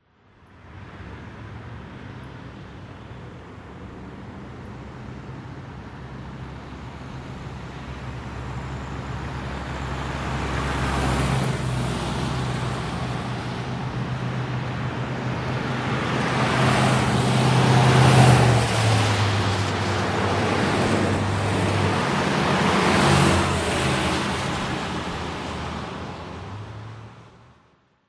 Road Traffic With Crickets; SOUND EFFECTS1

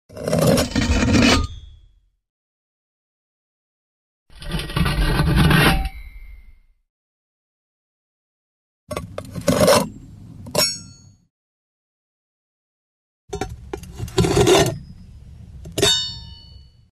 Metal Scrapes Gritty Pipe Scrape On Concrete; Hollywood Edge Foley Sound Library

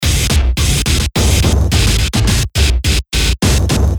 Loop - Bass ick Rock; Best Of N2O Library

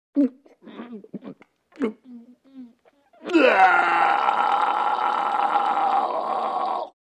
Gagging Man Gags w Long Dry Vomit, Human Sounds

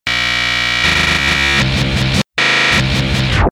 Dent In, Stabs & Bursts; Best Of N2O Library

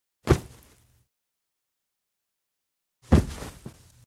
Two Single Body Falls on Bed; Fights Bodyfalls

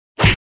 Punch Face Hit Sharp Impact; Fights Bodyfalls

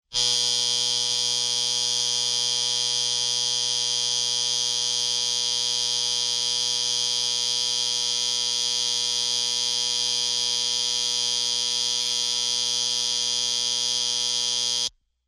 Alarm On Electric Clock; SOUND EFFECTS1

